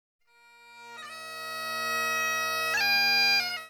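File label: bagpipe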